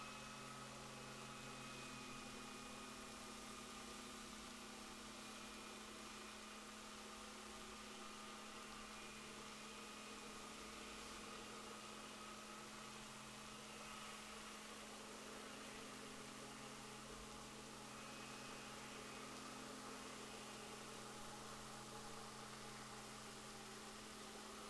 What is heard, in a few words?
rorquals positif, Balaenoptera Physalus Chile